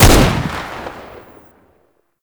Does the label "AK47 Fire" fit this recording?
Yes